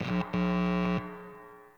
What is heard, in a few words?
DJ Booth Amp Plug In; Warframe; Nineteen Ninety Nine; Hub; Hub Pub